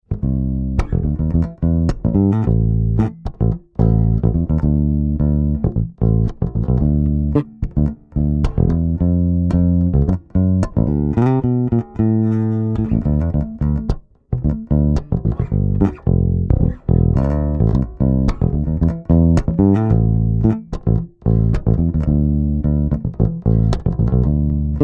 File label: BASS